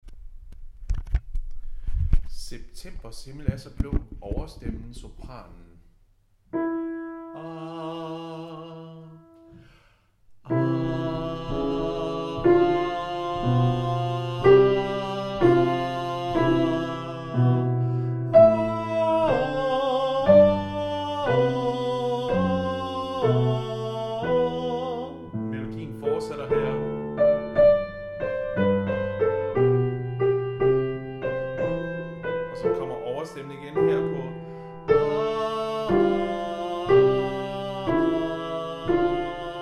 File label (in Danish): overstemme, koret; Septembers himmel er så blå